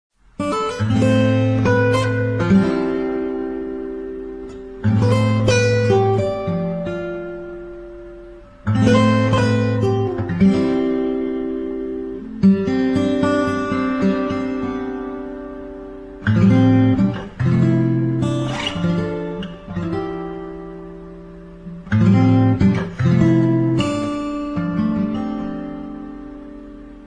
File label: acoustic guitar